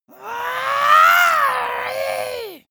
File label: monster scream high